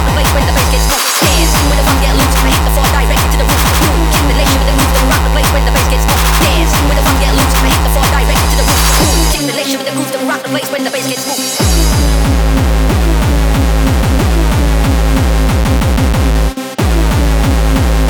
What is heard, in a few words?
bass